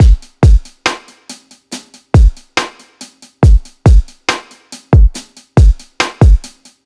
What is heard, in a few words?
drum original